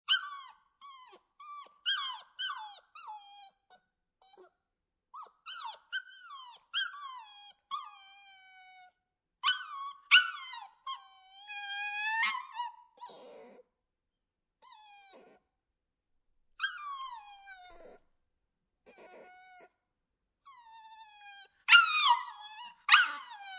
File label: Puppy Whimpering, Animal, Puppy Whimpering, Crying, Three Month Old Labbe Female Puppy Dog